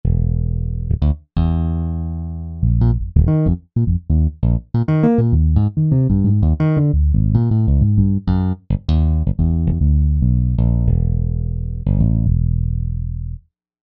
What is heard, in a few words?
Tap Bass